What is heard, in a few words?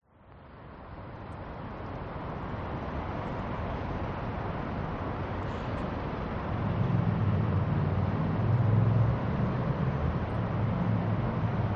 public places park dist traffic people church bell construction; lang sydney cbd; 12pm